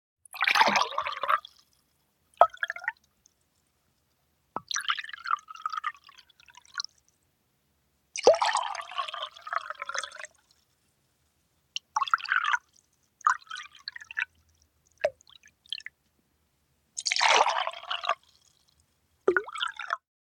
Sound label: pouring water